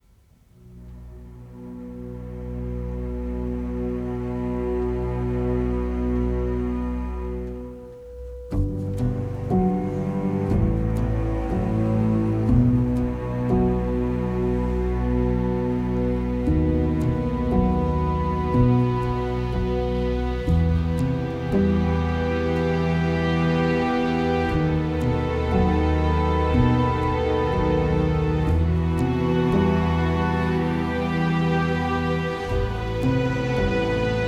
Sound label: Second Speech (String Version)